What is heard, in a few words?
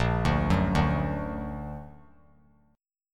Bb Augmented Bb; arpeggiated; JGuitar